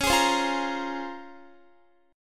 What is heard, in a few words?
Db Major 13th, Guitar; strummed; JGuitar